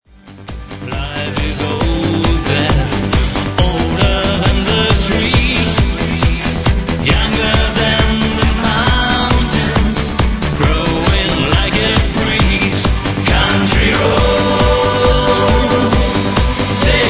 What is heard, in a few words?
(Disco Fox Mix)